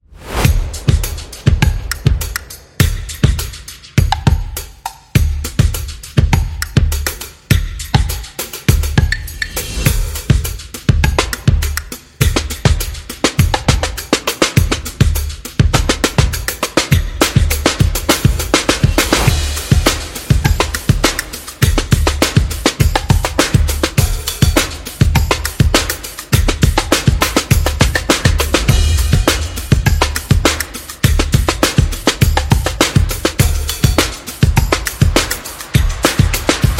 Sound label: Soul Drums Beat; calm drums